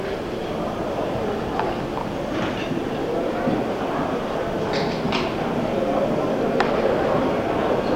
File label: station crowd